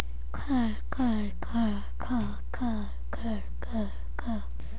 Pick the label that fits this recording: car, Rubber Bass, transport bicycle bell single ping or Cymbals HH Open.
car